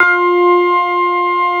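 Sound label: CX3 organ F4 L, Keyboards Old School; USB Soundscan vol.09 - Keyboards Old School; AKAI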